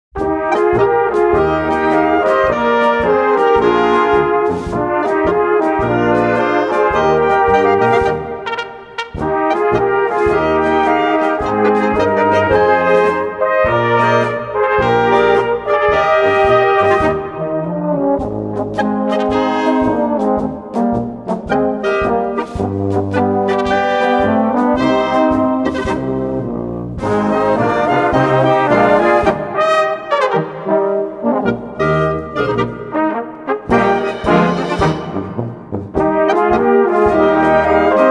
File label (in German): Polka